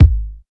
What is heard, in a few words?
warm sounding steel kick drum g sharp key